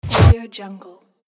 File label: whoosh